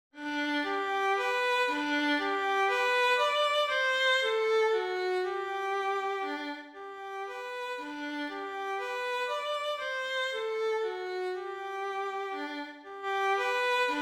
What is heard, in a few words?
violin; 2권 Children's Song- GREEN GRAVEL